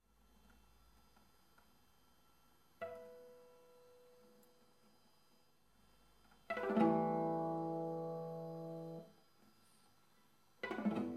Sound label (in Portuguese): Harmónicos em cordas contíguas